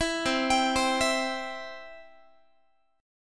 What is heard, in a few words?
C Major, Guitar; arpeggiated